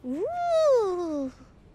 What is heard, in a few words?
wouuuh